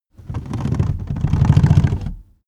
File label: car seat slide forward slow rattle